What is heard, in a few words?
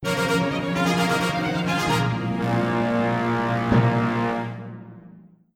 victory; Shattered Throne